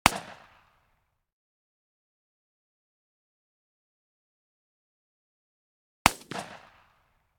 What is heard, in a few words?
12 Gauge Shotgun Blast Long; Hollywood Edge; Heavily Armed